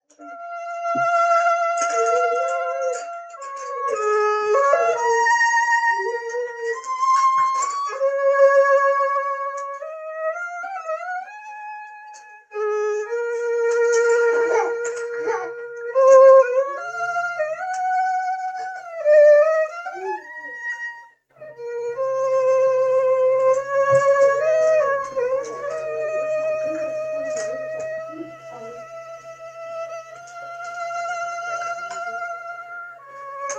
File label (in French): violon; Thais